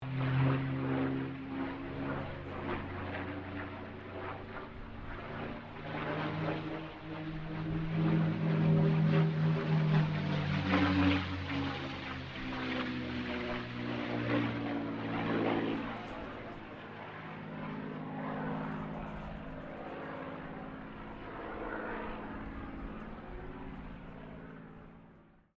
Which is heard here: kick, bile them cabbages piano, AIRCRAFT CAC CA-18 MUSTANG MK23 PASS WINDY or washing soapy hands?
AIRCRAFT CAC CA-18 MUSTANG MK23 PASS WINDY